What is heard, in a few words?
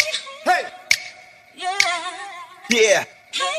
hey hey yeah yeah SUNG looped